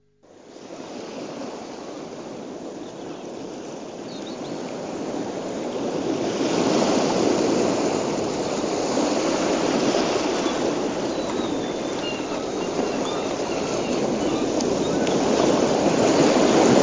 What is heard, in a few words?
Solfeggio Frequencies Sea Moods Relaxing Ocean Sounds